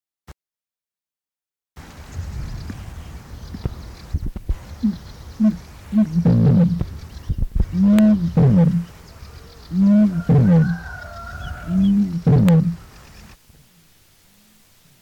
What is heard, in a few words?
Bittern